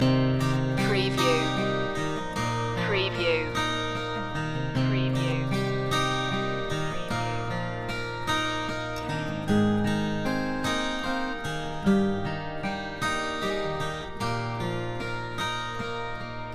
loop

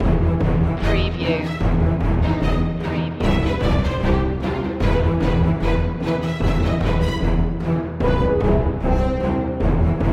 neon nightscape chase loop

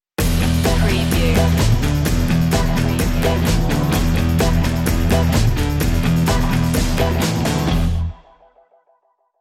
street grind stinger

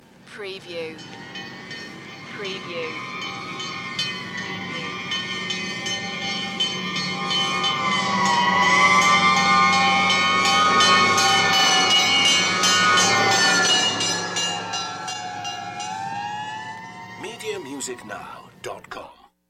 Fire Engine Pass By Siren

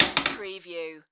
Toilet Lid Close

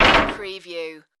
street metal plate

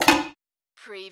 Button Sound Metal Can Drop

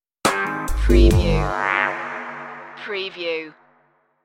stigmata stinger

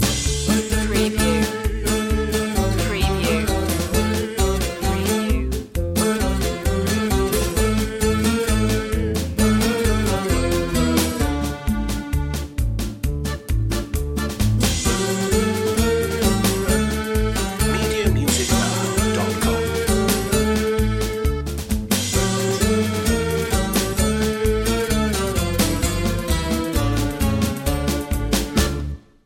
polka loop

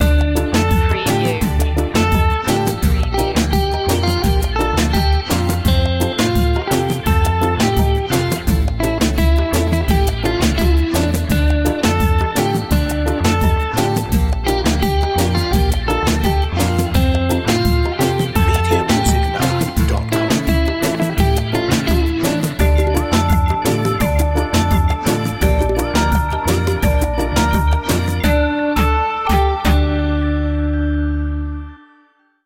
latin caribbean reggaeton

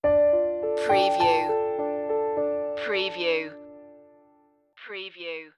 stockholm nights stinger

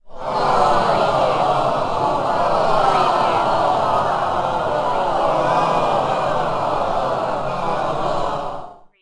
Crowd Aww long

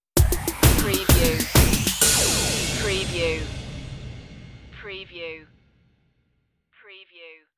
pop tv stinger

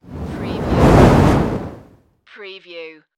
Fireball Large Flame Burst

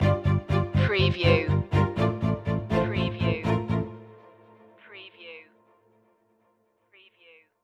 stinger

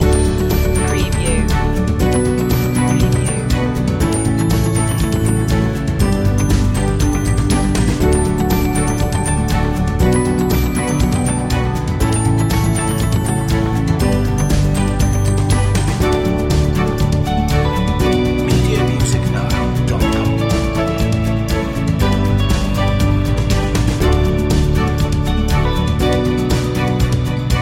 corporate acoustic

